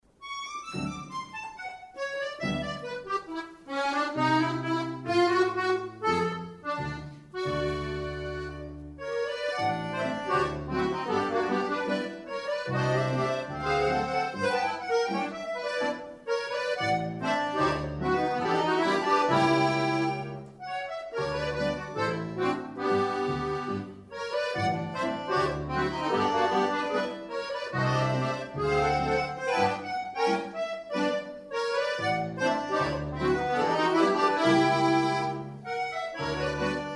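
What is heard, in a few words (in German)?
Konzertpolka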